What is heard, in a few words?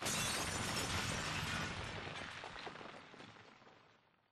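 explosion mid distance debris; corporate; Titanfall 2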